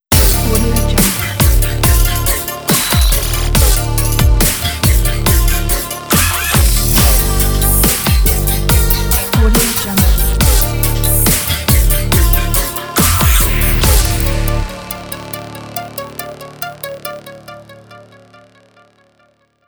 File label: brutal dubstep logo